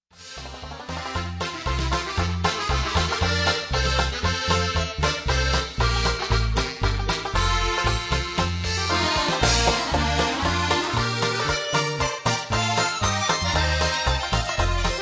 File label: Polka